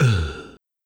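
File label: beatbox uh, percussion; Polyend; Legowelt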